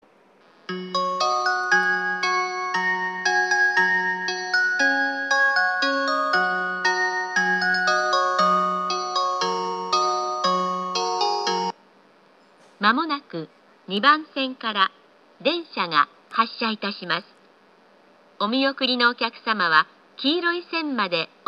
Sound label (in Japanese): 2番線発車メロディー, 山形駅